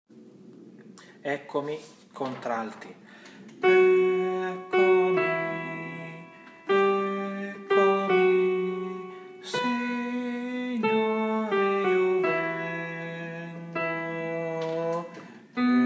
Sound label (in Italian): Contralti; Eccomi